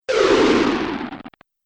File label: Science Fiction Laser Fall; Sci-Fi Laser Sound Effect